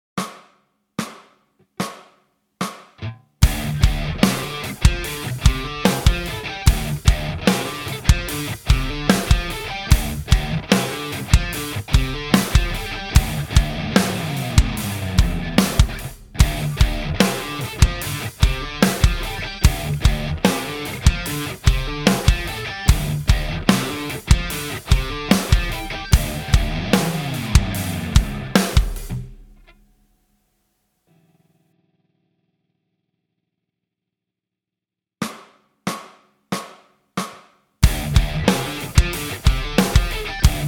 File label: Backing Track